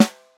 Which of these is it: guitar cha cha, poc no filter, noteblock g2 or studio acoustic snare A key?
studio acoustic snare A key